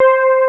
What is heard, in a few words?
PIAN.PADAC5; Big Fish Audio; Synth City